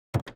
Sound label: reel Stop